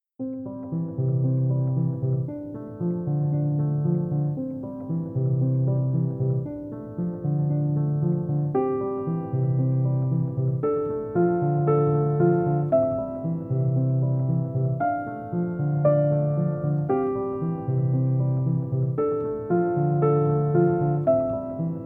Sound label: Classical Piano